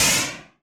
noise fx; Masterbits; Switch FX

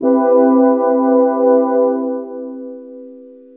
vio analog, dx7

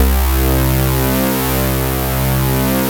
JUP6 BUZZ; Analog Odyssey

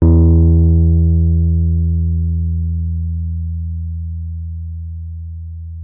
WURLITZER E2; Xsample VOL-1; WURLITZER RS

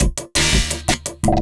LOOP, Drum & Bass, 170 BPM

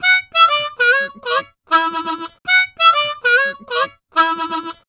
Harm 098bpm A, Harmonica

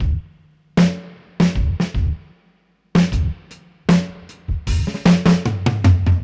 Beat Loops; Zero-G; Monster Beats

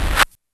DIRT SCRATCH; E-MU Formula 4000 Series Vol. 1; Hip Hop Nation; Hip Hop Kit 2

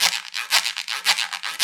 guiro, wood; Club-50 - Foundations

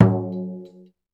BASH, drums; Spectrasonics; Supreme Beats

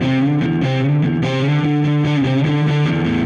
Dirty Chunk Guitar, Indie Rock; Silos; Sonic Foundry (Sony Creative Software); Indie Rock Anthems; Session 11 - Silos

